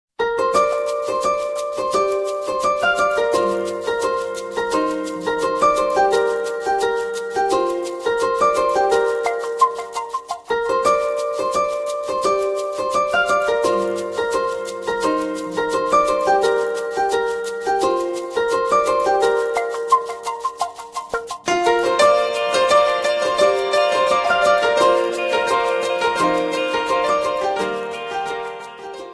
instrumental ringtone mobile ringtone instrumental ringtone